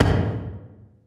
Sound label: footstep; Barotrauma; Barotraumatic; xanHuskmutantarmored